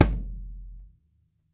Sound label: SURDO, Brazil Drum Perc; E-MU Producer Series Vol. 7 – Old World Instruments; Drums&Percussion